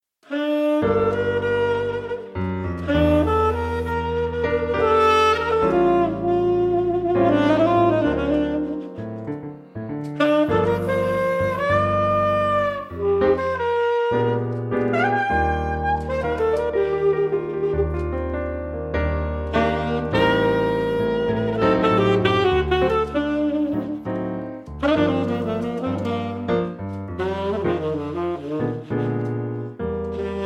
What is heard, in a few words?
ballad bolero